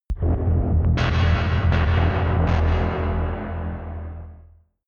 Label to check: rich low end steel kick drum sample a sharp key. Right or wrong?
Wrong